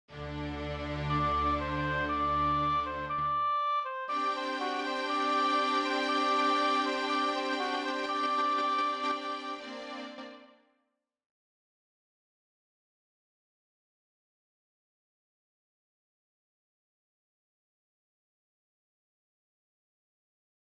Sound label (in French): hautbois